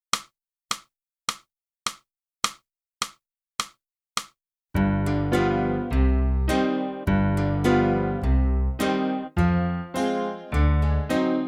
clarinet, heros farewell; Boosey Woodwind Method: Clarinet (Book 2)

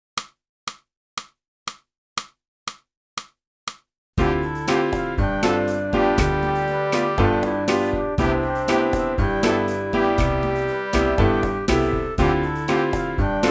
sax

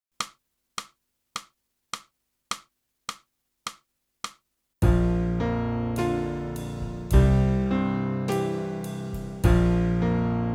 clarinet; boosey method

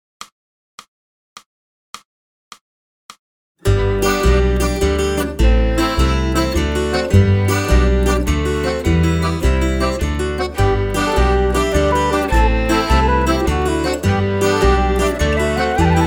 backing track

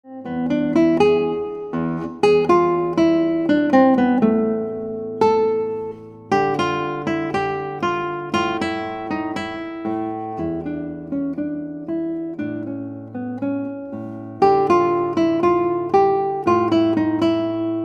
Guitar

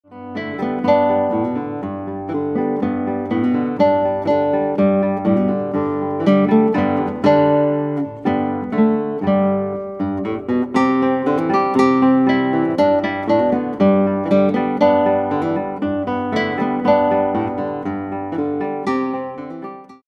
Guitar

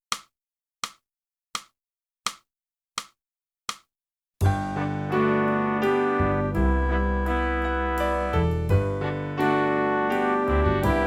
flute

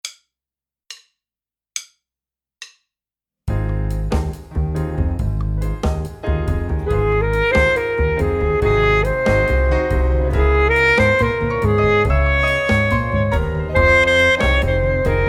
Student Sax

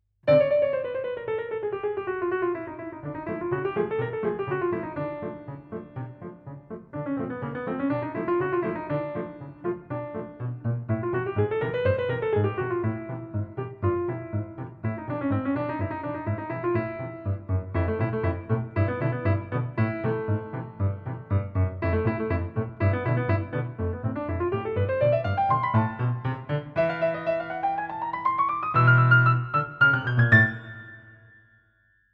piano

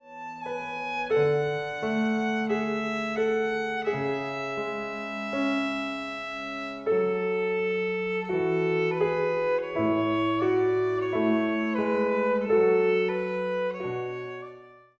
VIOLIN

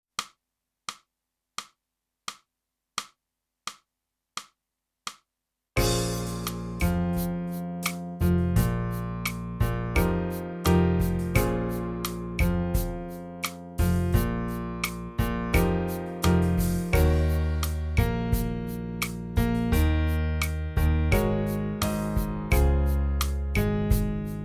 sax, golden sand

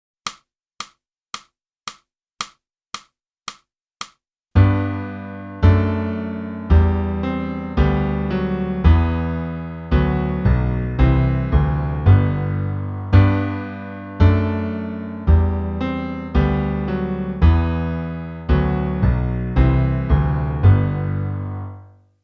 flute; boosey

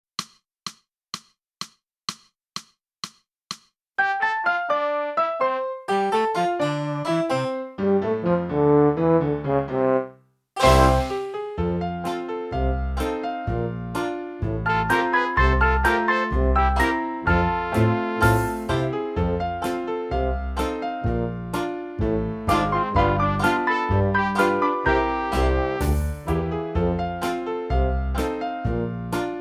flute, the entertainer; (Morgan) - Boosey Woodwind Method: Flute (Book 2)

